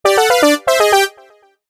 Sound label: Wacky Character Jingle; Dumb Ways to Die 2 The Games; Android